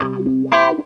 CLAV; USB Soundscan vol.32; Roots Disco; AKAI

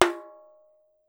TAMBORIM, Steel Percussion; World Combos; E-MU Producer Series Vol. 7 – Old World Instruments

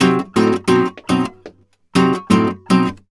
Flamenco Guitar Frase; Best Service ProSamples vol.46

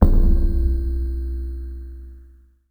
Tone, IDM

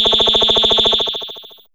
Phone A3, Fairlight CMI IIe Effects

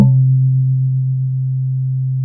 Kalim Lo C3, Fairlight CMI IIe

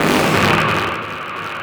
SFX, Drum & Bass, 168 BPM; Ueberschall; Drum & Bass Resonance

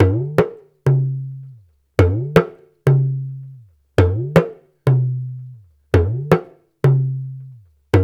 AFRO, African Contemporary, AfroTriangle

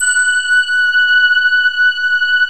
DIGIBLEND; OMI - Universe of Sounds

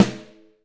Snare, Alesis DM5 Drum Machines

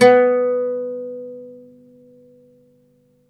OUD B2 -L; E-MU Producer Series Vol. 7 – Old World Instruments

